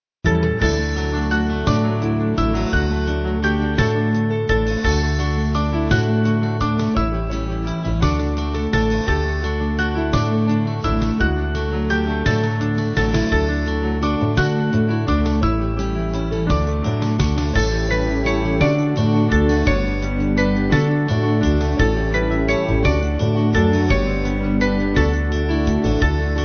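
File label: Band, Music Backing Files for Churches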